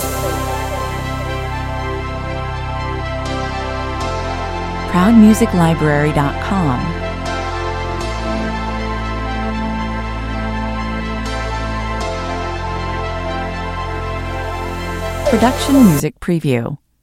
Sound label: synth wave loop